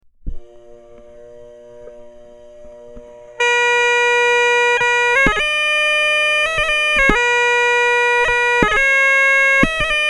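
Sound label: chanter, Bagpipe; you can hear the pops when my fingers hit, the mic clamped between low A and the tone holes; Grainger; contact mic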